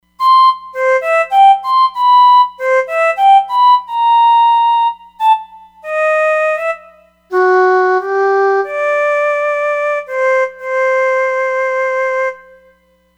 Piccolo

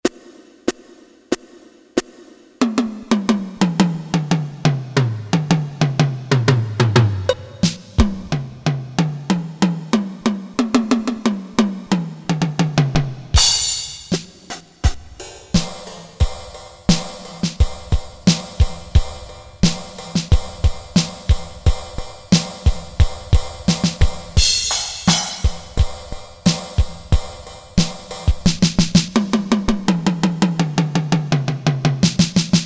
ROCK KIT

